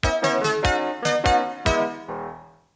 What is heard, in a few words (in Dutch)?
Breker; jingles radio zoniën 1982-83